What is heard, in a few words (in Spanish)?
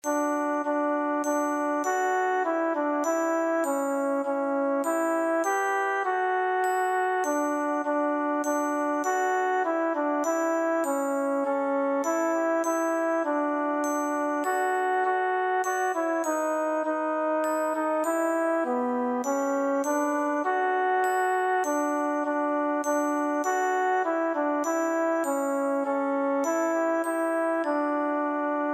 2 VOZ; Llámanos